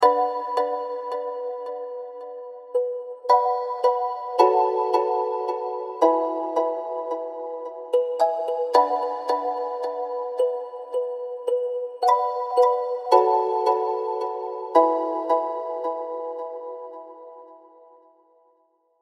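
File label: Digital Delay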